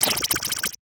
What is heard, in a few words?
Window Open Thin, User Interaction; Rescopic Sound